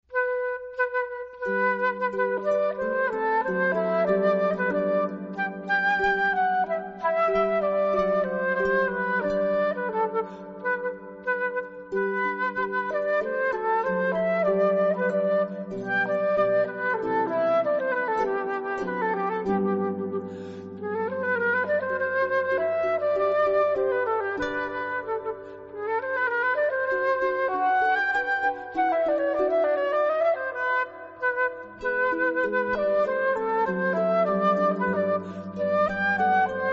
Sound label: Romance, harp